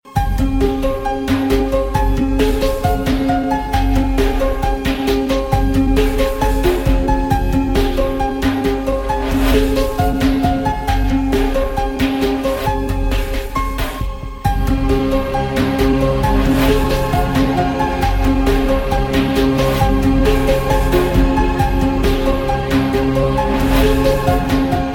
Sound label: Mystical